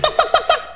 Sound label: puk puk puk, chicken; super chicken